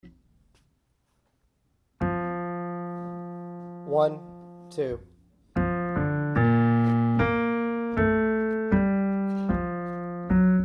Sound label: bass